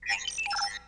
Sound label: Menu Button Clicked; DS9FX